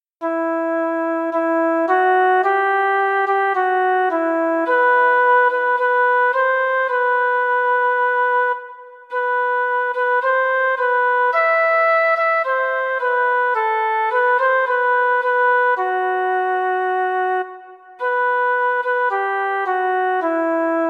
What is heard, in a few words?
Rain 108bpm